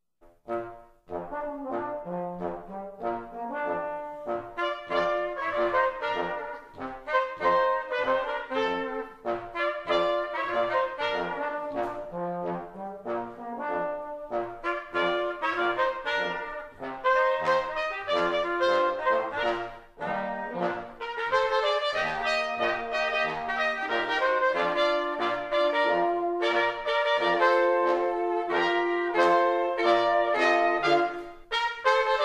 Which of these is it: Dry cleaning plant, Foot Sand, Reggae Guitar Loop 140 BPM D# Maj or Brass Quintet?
Brass Quintet